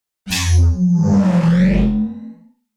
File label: Rhino